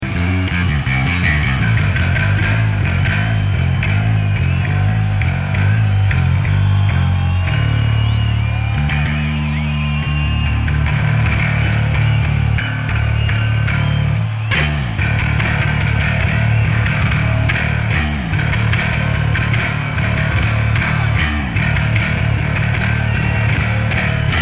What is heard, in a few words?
Bass Solo